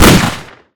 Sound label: six shooter fire